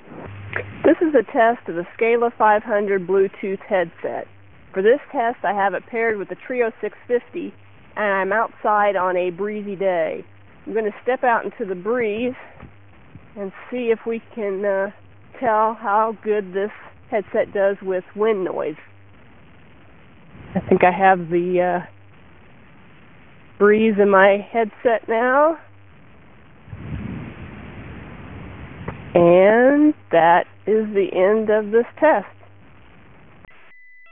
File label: outside; cardo scala500